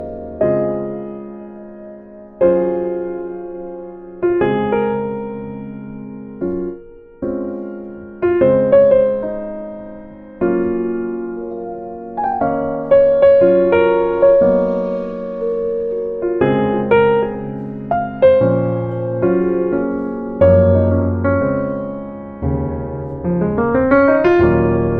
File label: piano ballad; Music